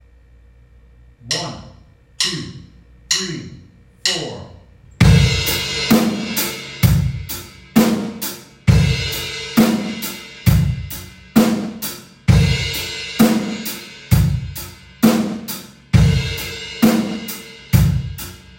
crash cymbal

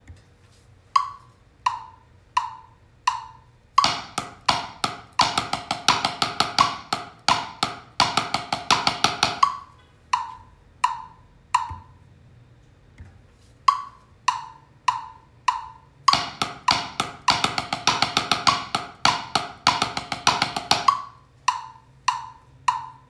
paradiddles, drums

